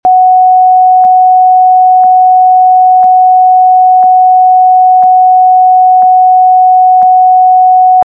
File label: Steady